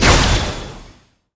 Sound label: Active Shot; Strongattack Shot; gamerip; Enemy Boss Common; Se Enemy Bigbumpo